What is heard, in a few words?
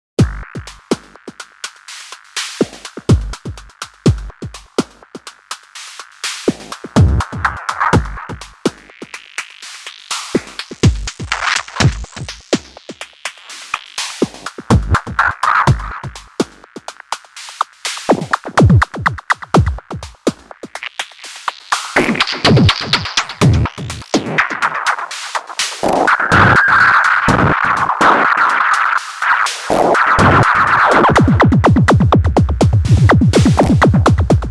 Disto Drums Seq